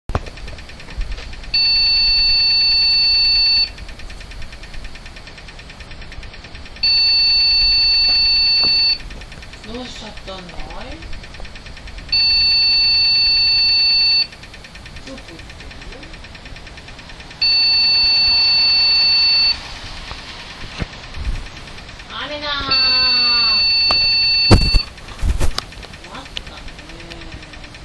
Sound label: beep